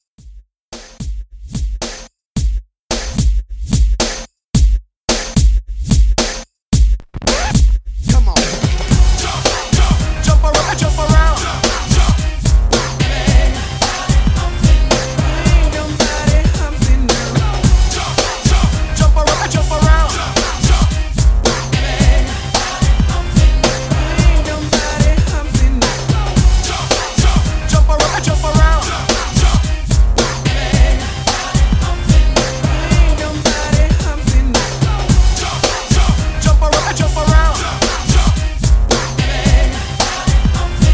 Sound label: Blend Intro Re-Drum 110